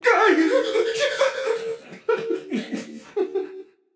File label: fear, voicepack; smite; jorm